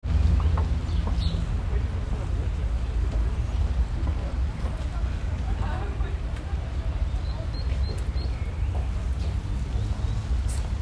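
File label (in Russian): парк птицы звуки среды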